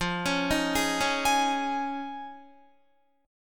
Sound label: F Minor 7th Sharp 5th, Guitar; arpeggiated